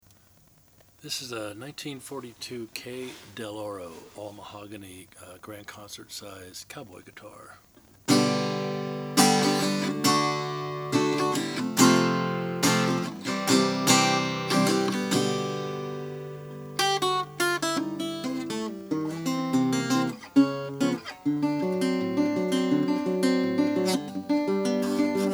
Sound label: kay del oro cowboy stencil